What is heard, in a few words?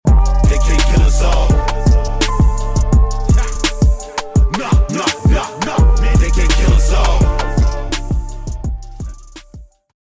lost round, music